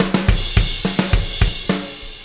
Fill, Loops; Sonic Foundry; Not Just Another Pretty Bass; AI Loops - Siggi Baldursson

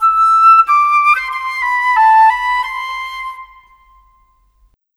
Flute, Cinematic; Sonic Foundry (Sony Creative Software); Orchestral Series VOL-3; Cinematic 24

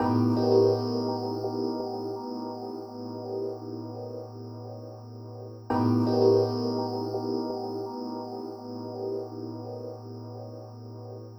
EP, Pads; Sonic Foundry (Sony Creative Software); Synchro Funk

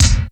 GATED KICK

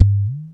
Udu low; Ethnic

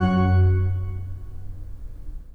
PIPE, 3DOrgans

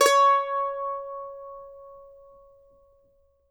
MANDO NOTES, C#5; Sampleheads; Whole Lotta Country 1 & 2

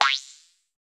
boing; Spectrasonic Distorted Reality 2; BEMUSED

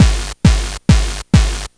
Game Boy Kick Loop, 8-Bit, Drums

